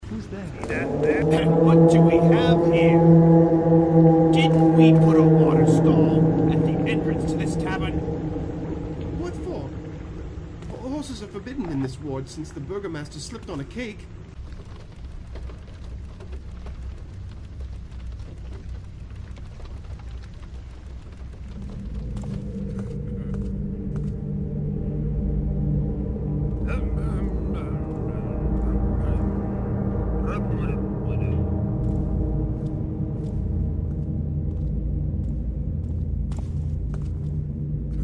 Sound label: ambient